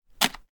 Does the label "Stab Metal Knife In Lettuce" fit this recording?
Yes